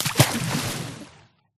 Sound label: splash, dolphin; mob; Minecraft